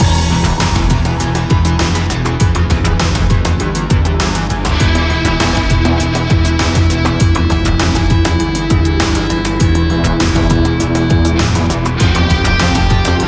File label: theme, music combat